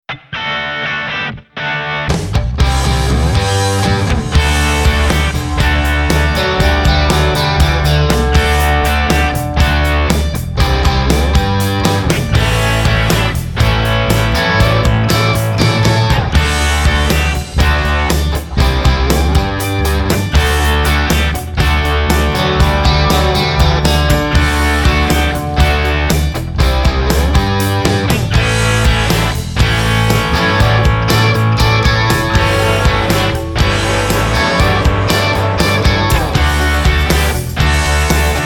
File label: Rock